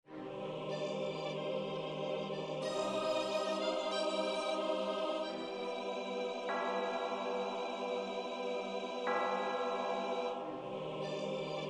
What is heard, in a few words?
doorbell, midi; Misc